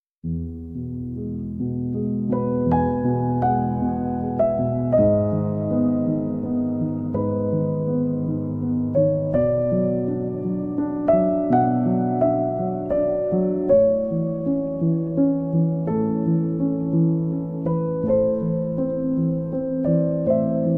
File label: Duet